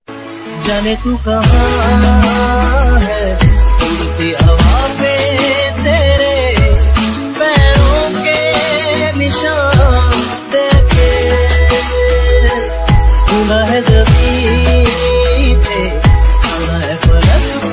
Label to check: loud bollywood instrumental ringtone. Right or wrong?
Right